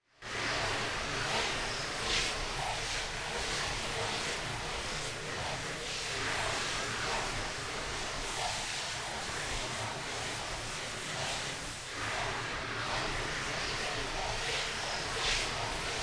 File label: Horror Scary Swirling Wind Digital Demonic